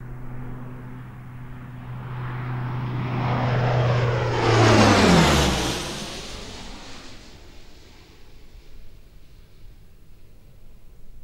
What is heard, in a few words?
C130 Med Fly By, Hercules